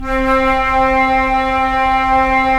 Flute, Roland LA Composer

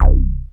Bass Synths hits and octaves

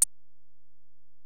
HiHat, Alesis DM-PRO

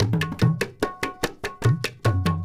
INDIA L; Spectrasonics - Heart of Asia CD2

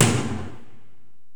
Korg x5, Drums

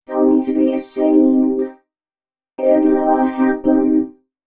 female c#, Dance Ultra Voice FX; Mutekki Media

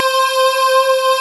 GLASS C4, D50; D50 STORY; East West; Global Sounds

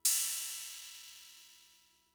Cymbal, Fricke MFB-522, Drum Machines

